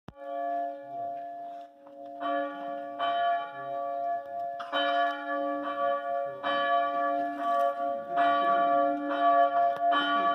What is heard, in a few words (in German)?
Christuskirche Läuten; Wettelsheim